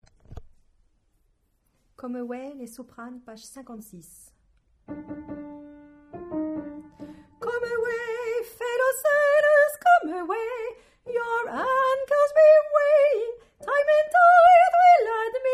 come away soprano

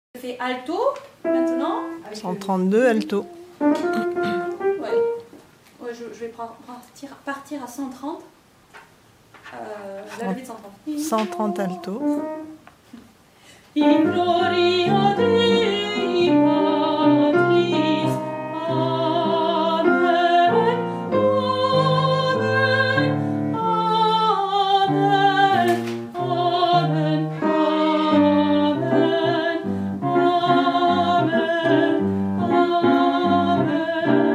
gloria mezzo

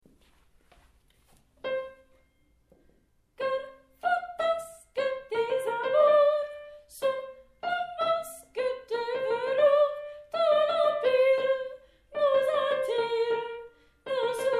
tenors

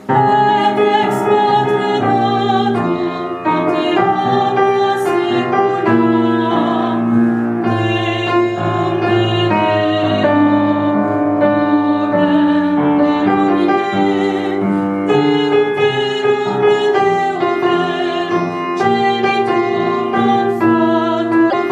credo tutti

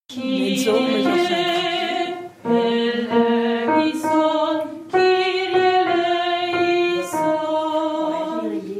kirie mezzo

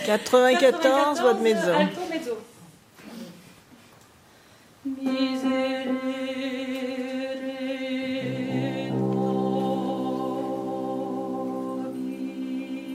gloria mezzo

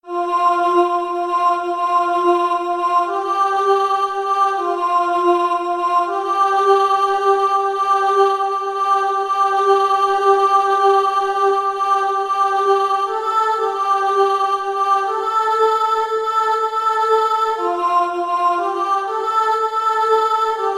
chorus alto

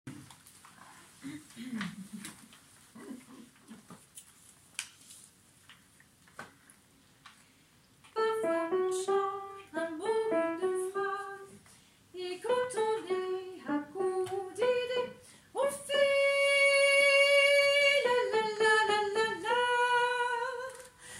sopranos

